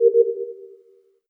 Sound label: Task Inprogress; Among Us